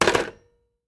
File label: telephone hangup; Golden Crayon Sounds